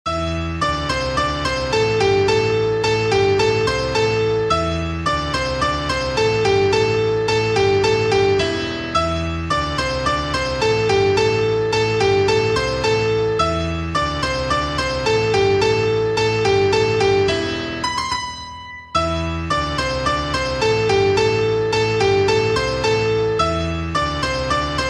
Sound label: piano